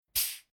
Industrial Air Tool Connector Coupling Air Release Slow; Hiss